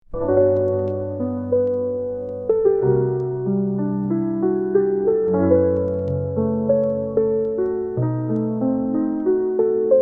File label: relaxing piano lofi instrumental